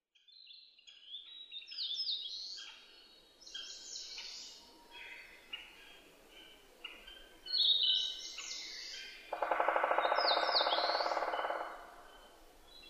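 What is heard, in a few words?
kera sp, mole cricket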